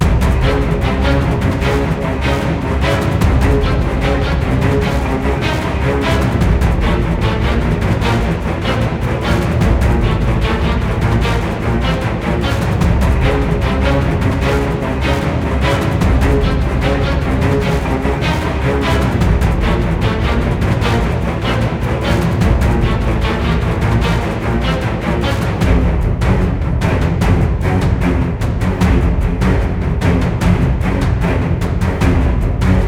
Minigame Danger Music; Halloween Stories Invitation

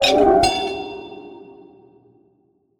Ui Chest Item; Android; Portal Quest

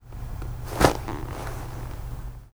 land High foley; Aragami

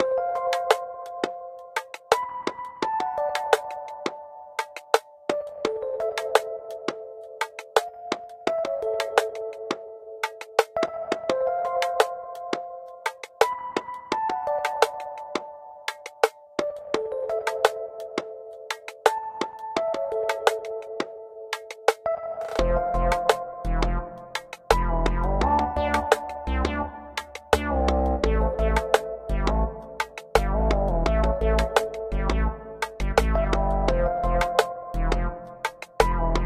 Match3 Music Loop; My Tamagotchi Forever; android; GSFX Match3 Music Loop

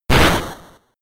Hit Normal Damage

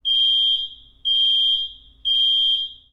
Mall Alarm; rebel cops 2019

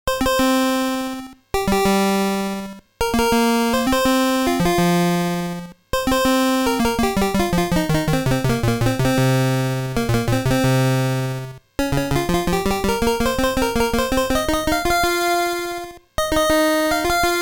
Battle BGM; Game Sound Museum Namcot; Genpei Toumaden

